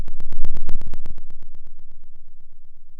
pop

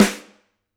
Rim, drum

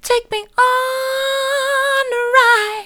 take me on a ride soft, vocal

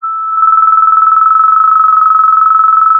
pop, Sound of a phone ringing

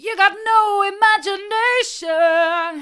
you've got no imagination hard, vocal

